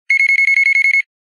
Alarm Clock; Lenovo; P700i; alarms

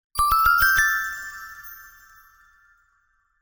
Shimmer; TCL 20 5G

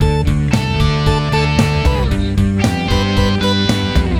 brit-pop, ringtones; Google; NewWave Labs

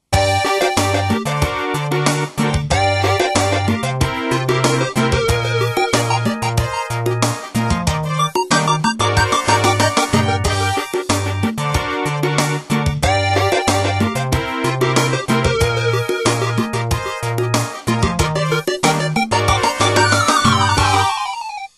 echo

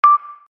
Video Rec Start; Oppo Ulike U701

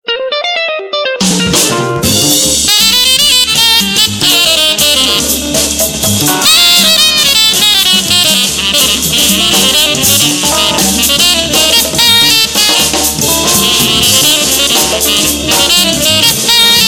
Jazz bar; Samsung Galaxy Tab GT-P1000 India

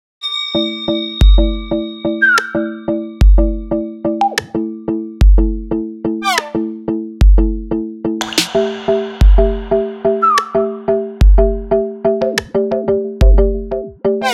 RINGTONE MUSIC; HTC Infobar A02

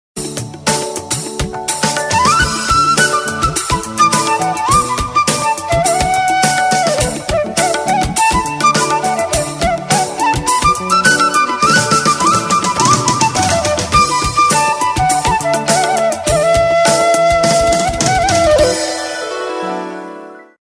Flute; WAO; alarms

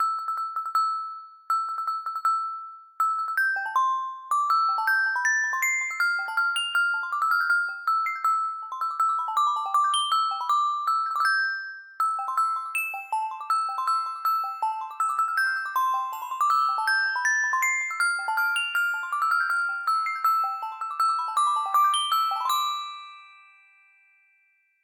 jingle bells, ringtones; Google; Google Sounds; Seasonal Celebrations

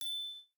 In Call Notification; OnePlus OxygenOS

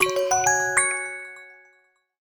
Crystal Notification

